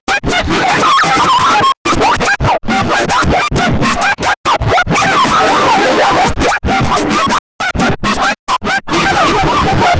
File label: black eyed butterfly, wings